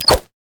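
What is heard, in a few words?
concussion grenade; LoL; heimerdinger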